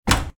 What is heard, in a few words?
door close; reading diagrams